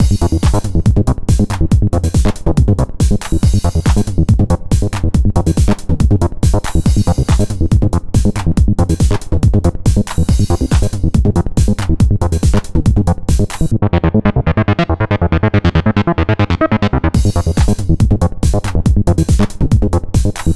Pocket Operator Modular 400 SQR SAW Beat; Teenage Engineering

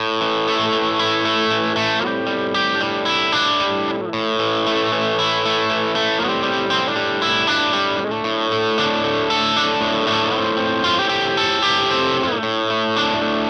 Gibson Les Paul Studio Bridge

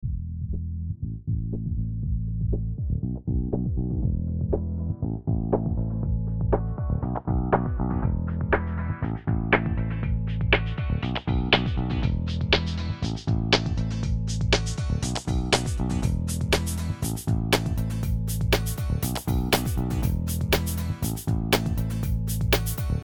LPF 24dB Reso Mid

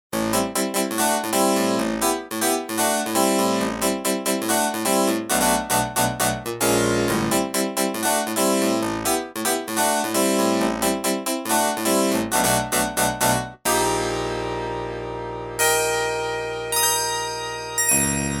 Roland MKS-10 harpsi chorus

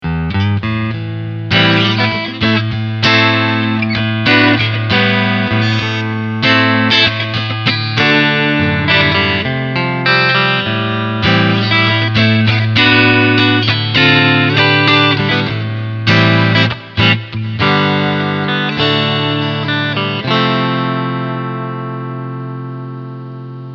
Clean Strumming Front SC

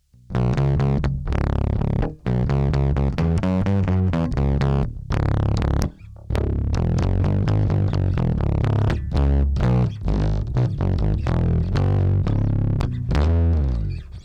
bass DI Preamp DRIVE

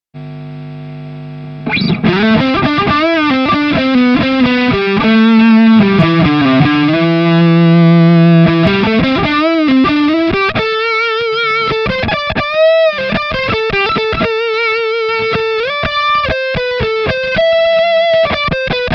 Plug In Engl E765

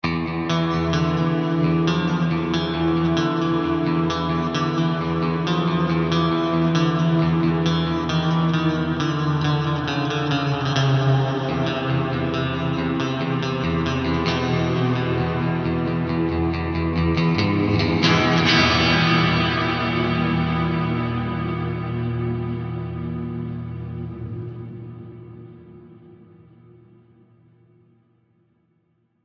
Neural DSP Soldano SLO 100 Dream

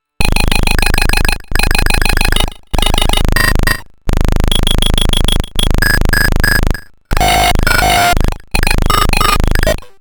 Error Instruments Drums Chip Tune X Parameter

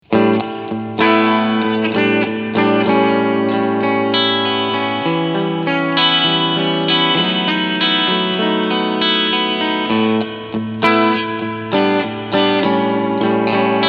Surfybear Reverb Cleansound

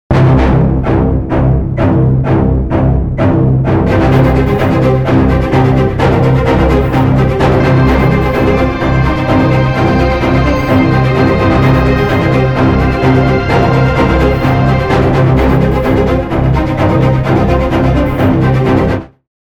Straight Performance Combo

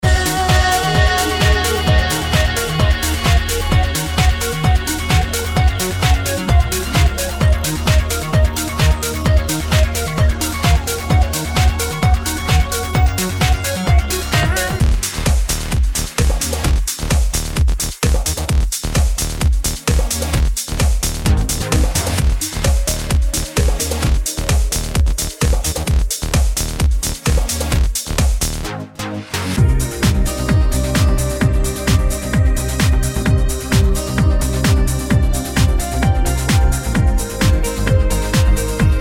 Trance

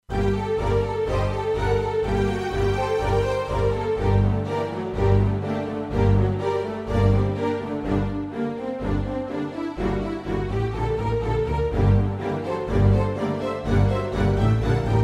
violin concerto

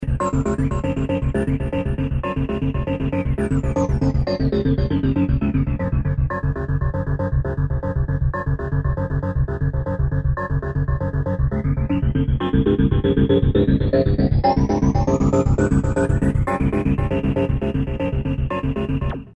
trance performance

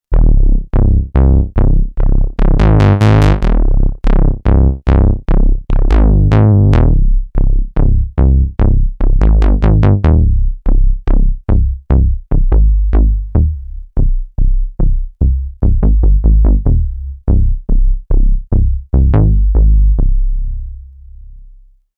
Bass

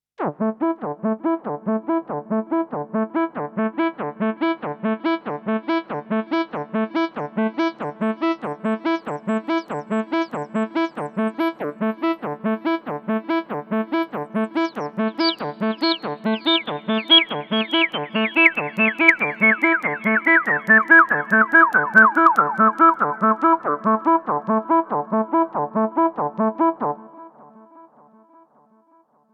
Arpeggiator Cut Off Resonanz

